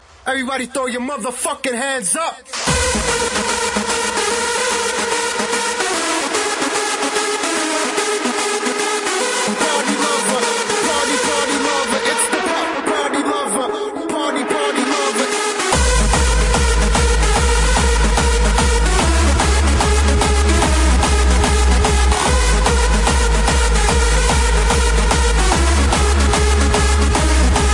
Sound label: Hardstyle Party